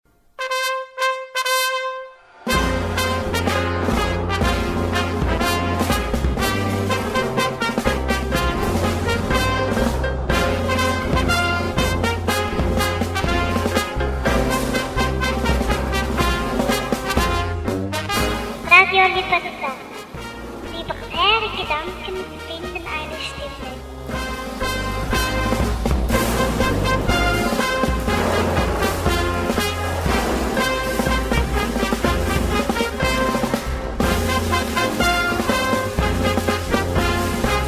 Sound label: jingle